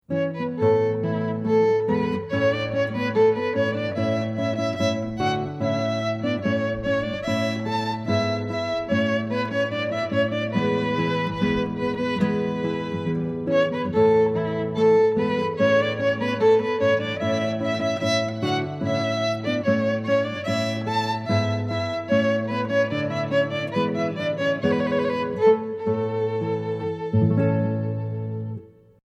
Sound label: violin and guitar duet